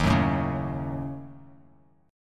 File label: Eb Minor 7th Flat 5th, Bass Standard; strummed